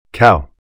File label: Cow; on tap ve chu de dong vat